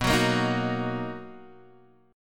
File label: D sharp Minor B, Guitar